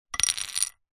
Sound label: leisure poker dealer button chip drop hard surface; zapsplat